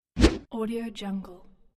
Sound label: swoosh